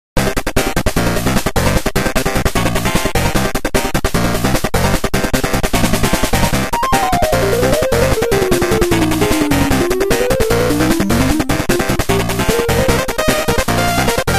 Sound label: chiptune